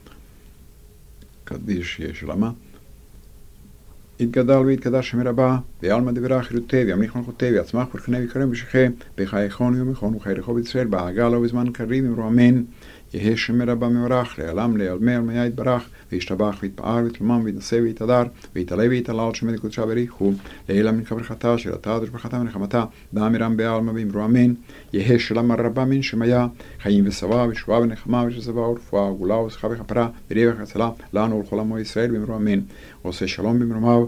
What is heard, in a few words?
Kaddish; Shabbat Minha; Yehe Shelama